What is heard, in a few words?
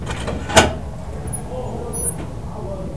Door Open; inner demons